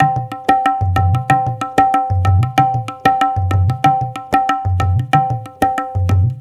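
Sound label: 93 bpm, udu; Misha Udu; Spectrasonics - Supreme Beats - World Dance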